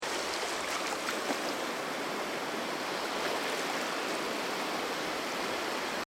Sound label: Ocean Beach Sound Of The Sea Surging Billows; Nature; CraftedSignals